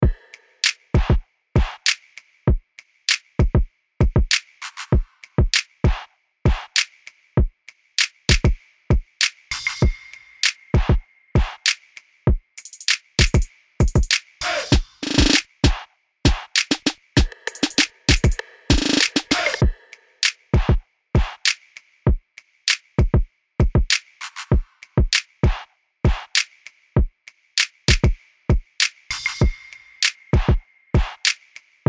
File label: rap beat; 2nd period egypt